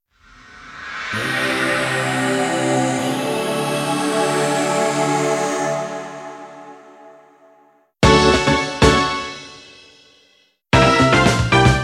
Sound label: Golf Jingle; Yakuza 3